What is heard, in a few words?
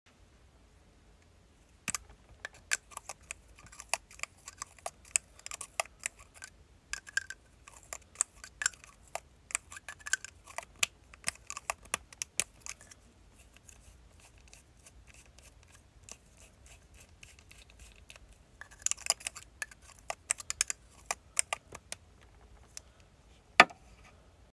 Opening and closing lipstick